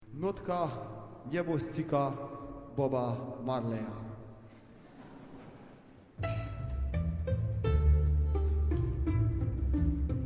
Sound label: Reggae choir